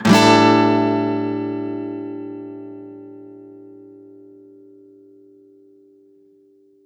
Guitar 128 BPM Dmin, One-Shots; Ghosthack Advent Calendar 2021 - Day 10; Guitar Loops and One-Shots

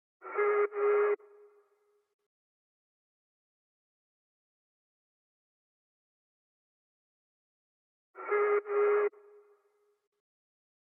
121 BPM C# Min Vocals; Loop Stems; Cymatics; Ashtray

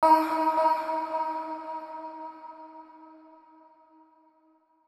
110bpm E, Vocalpack; Vocals

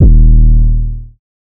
808 D#, Hip Hop; 808s; Cymatics; Cobra Hip Hop Sample Pack

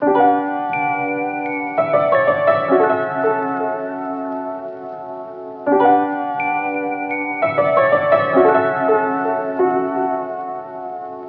Lofi Melody Loop - 85 BPM B Min; Melodics; Cymatics - Eternity Sample Pack

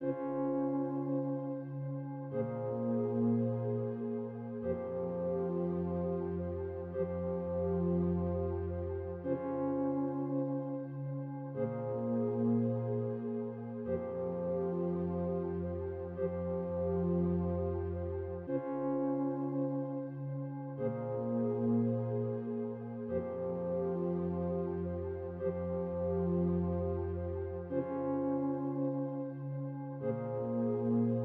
104 BPM F Min Pad, Latin; Loop Stems; Cymatics; Otro Dia

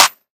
Shaker Clap; Drum Samples

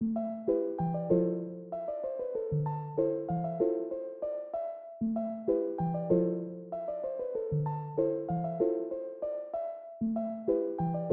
96 BPM A Min Pluck Pad; Melody; Loop Stems; Cymatics; Infinity Melody Collection (Preview); Rooftops

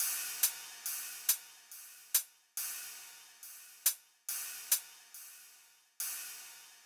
Riddim Drum Loop 140 Top; Ghosthack; Riddim Essentials Volume 2; Full Drum Loops

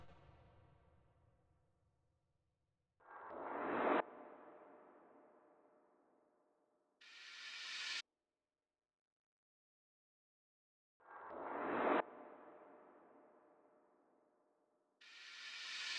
Drum Loop 120 BPM Sweep; Loop Stems; Cymatics; Vibes II Drum Loop Collection; Operation

